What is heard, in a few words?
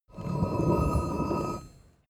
metal scrape